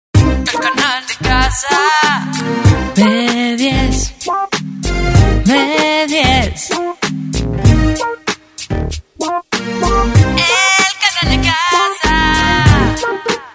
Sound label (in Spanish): Jingle; Blanchet